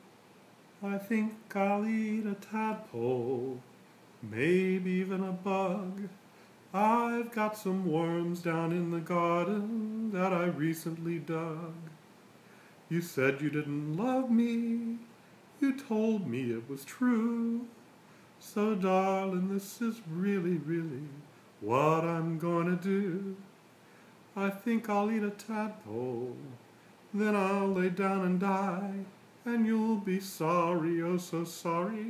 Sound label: Tadpole Song